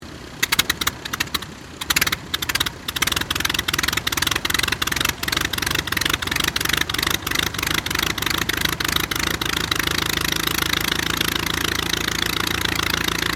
AIRCRAFT AUSTER III STARTUP STEREO; Airplanes

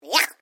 Created Creature Small Yuck, Alien Sound Effects; Created creatures, vocalisations and pretend speech

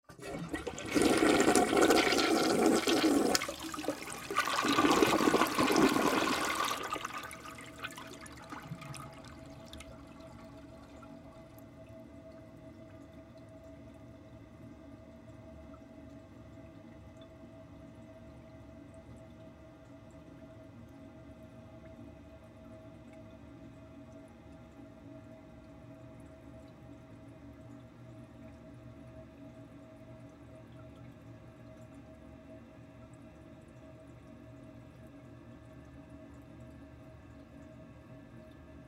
Household Toilet Bathroom Flush Bowl Perspective; Water Run Long; Blastwave

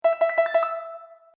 Bright Sound Video Game

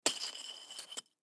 Snow Bottle Drag; Ice; Weather; Ice Sound Effects; Blastwave

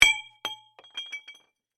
Impact Glass Bottle, Drop, No, Break; Smashes; Objects

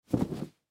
Towel Shake Out; Bathroom; Blastwave; Household

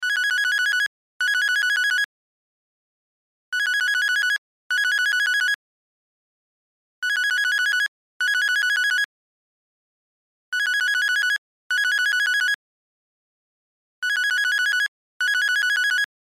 Cell Ringtone; Loop; Cell Phones; Blastwave

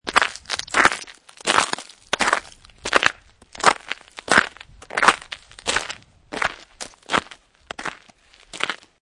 Snow And Ice Footsteps Ice; also great as a bone break, breaking bones sound; Weather

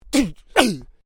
Male Vocalization "Hun Cha"; Male vocal sounds; Exclamations (Sound Effects:Voice)

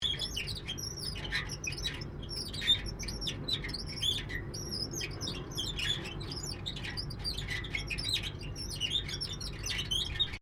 Vetilator Rotary Roof Mounted Loop; Various old or antique devices; Machinery Sound Effects; Machinery (Sound Effects:Machines)

